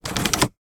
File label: van fan duct vent direction dial iveco; transportation; sound effects; sound effects one